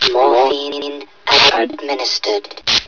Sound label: zombie idle